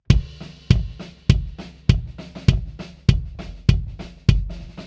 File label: Kick Drum Phase Not Inverted